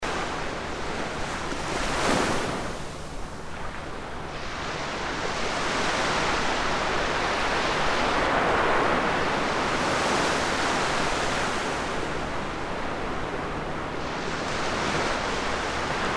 海の音

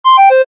場面切り替え 笛風 遅め

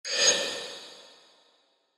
トランジション

